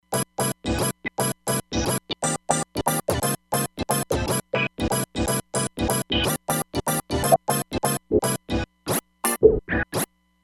Techno Snips, Roland Fantom XR

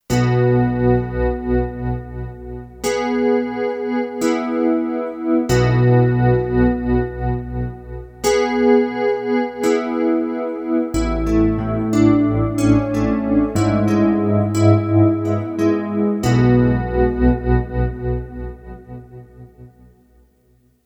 Dreamers, Kurzweil K2500X Factory Programs

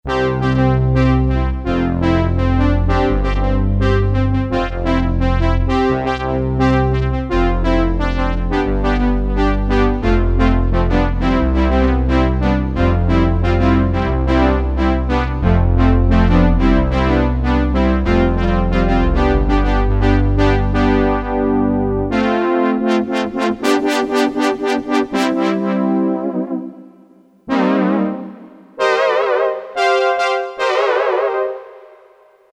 Brass, Korg DSS-1 DWGS synthesizer presets; Korg DSS-1 Sound Library

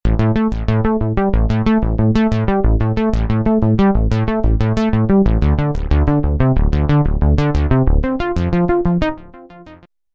Solid Arps, Roland V-Synth; Factory Patches

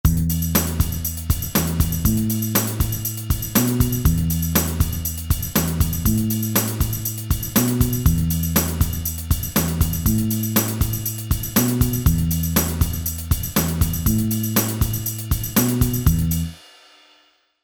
Rock

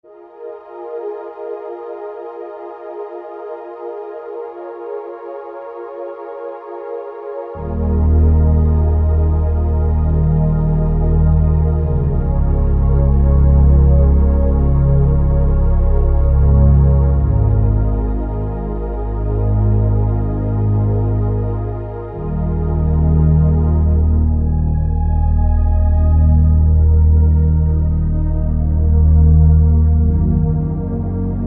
Pad

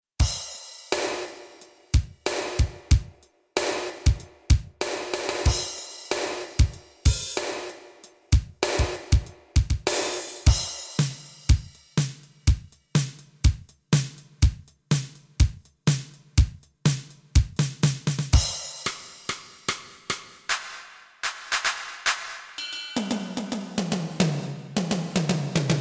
Internal Rhythm, Roland JV-880; Factory Patches

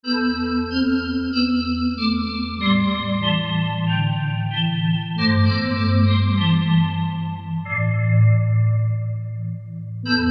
Tibetian, Korg MSC-10S Ethnic

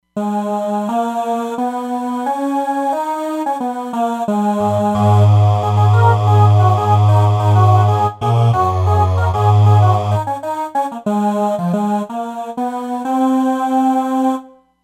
Aah Vox, Roland Fantom XR

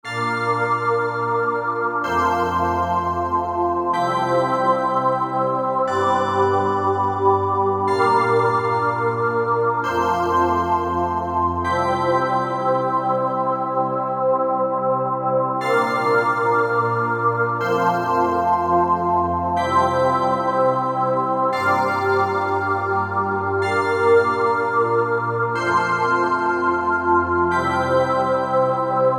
Sphare, Korg MSC-9S Organ

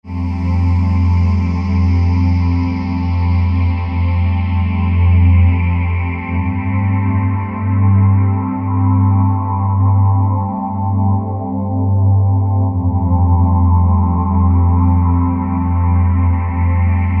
Lunar Strings; Apple GarageBand 2

